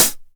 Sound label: 909 HH Tape; Goldbaby Tape808 & Tape909; The Tape 909